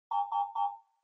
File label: News Alert Far